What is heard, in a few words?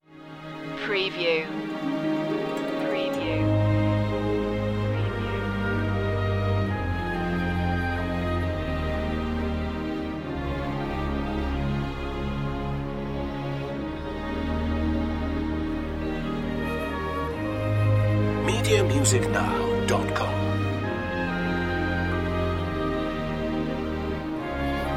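no piano; symphony orchestra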